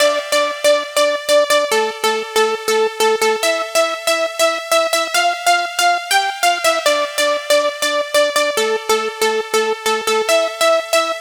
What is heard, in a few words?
Sequence DRY 140BPM, D minor; Melody & Synth; Vengeance Samples